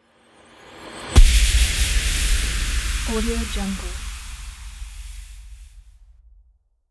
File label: fast sub transition